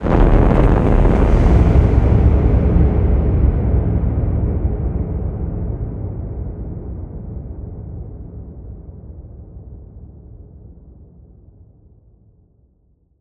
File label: cinematic texture; bluezone; sonniss gdc